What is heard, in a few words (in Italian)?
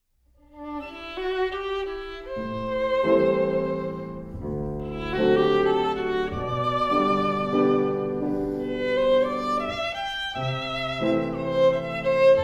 duetto